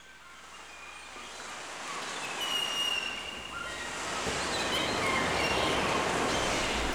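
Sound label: environmental, Drum N Bass; Sonic Foundry (Sony Creative Software); Knutrix Drum & Bass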